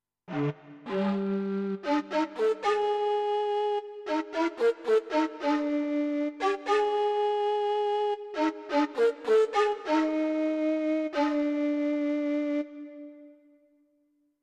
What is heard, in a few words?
Shakuhachi